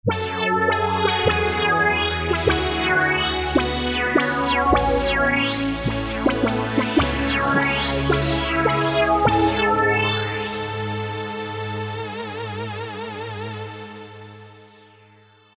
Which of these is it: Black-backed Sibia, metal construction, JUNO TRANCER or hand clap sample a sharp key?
JUNO TRANCER